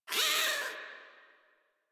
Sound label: Forest Rodent Vocal Angry Short; Characters; Warframe